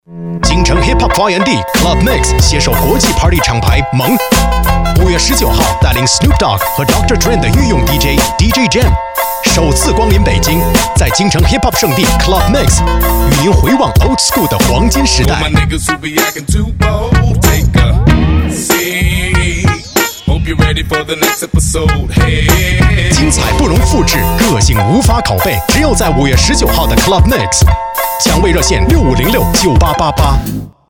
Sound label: Mix Club